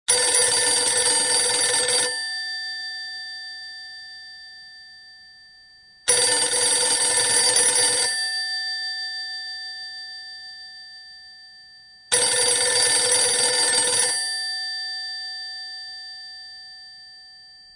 Rotary Phone, ringtones; old phones

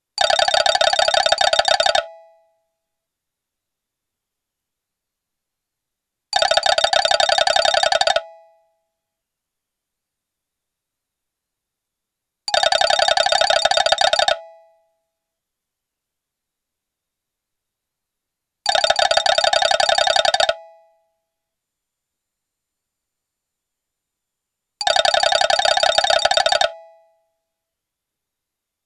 Old Malaysian Phone; ringtones; old phones

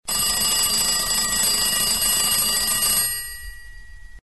Telephone, ringtones

